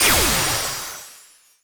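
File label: Sound Effect Time Up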